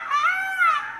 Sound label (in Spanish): Pavo real